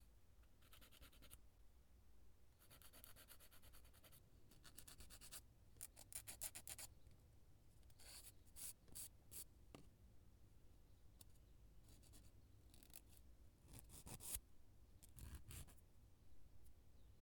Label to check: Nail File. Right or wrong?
Right